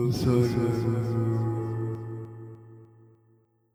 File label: die, monsters; cyrax; sackrax